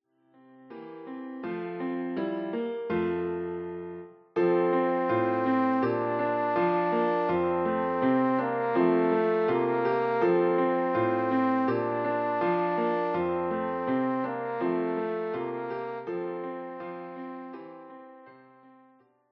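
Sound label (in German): O du froehliche, Horn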